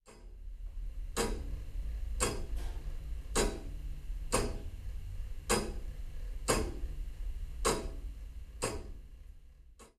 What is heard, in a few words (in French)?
LA PENDULE